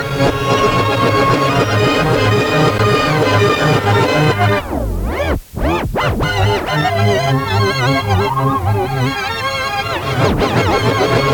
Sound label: Old Sick Organ